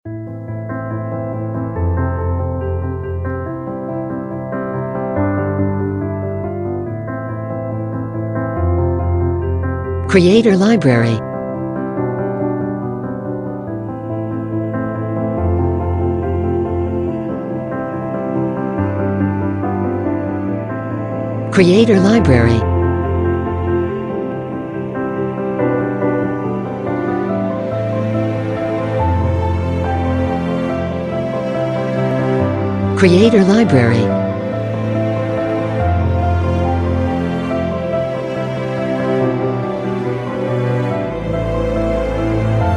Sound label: Sentimental, cinematic music